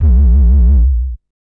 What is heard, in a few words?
Wobble Bass, Drum & Bass; Fluid Dynamics